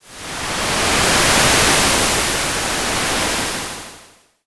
wave

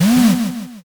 servo

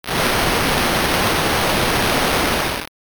fire

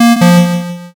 error

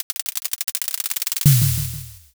drumroll

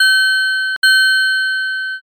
bike bell

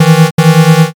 wrong

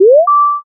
unlock

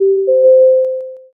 m notify

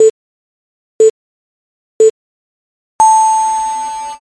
jiho

